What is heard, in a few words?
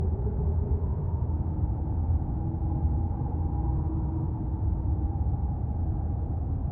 Ambience, Underground, Cave, Wind, Cold, Howling